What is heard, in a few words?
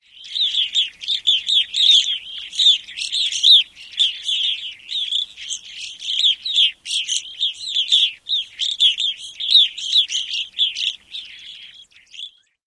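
flock calls, Evening Grosbeak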